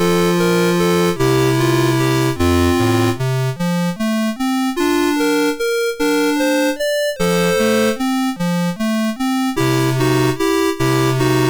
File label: Lullaby, MOS6581R3